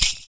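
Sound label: PERC, percussions; Univers Sons - Raricussions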